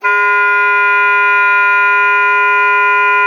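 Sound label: oboe d'amore; Xsample VOL-7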